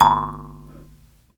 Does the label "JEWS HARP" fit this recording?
Yes